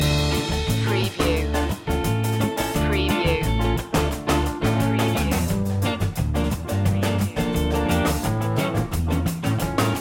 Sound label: latin quarter loop